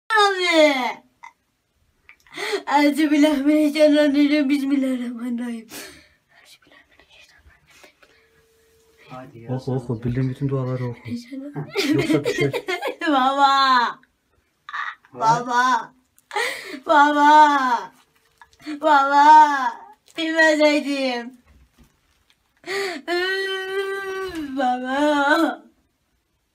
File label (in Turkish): asansorde kalan cocuk babaaagg